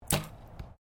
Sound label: Archery Competition Bow Firing; Various archery sounds; Miscellaneous Sports